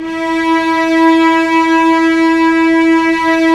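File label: cellos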